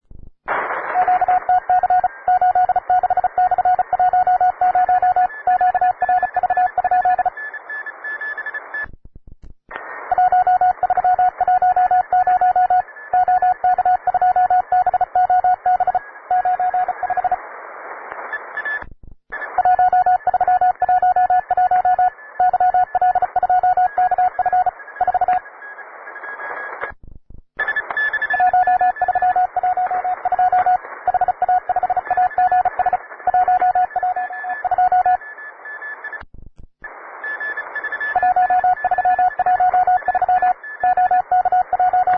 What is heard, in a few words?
cw, advanced; Training QTCs; QTC list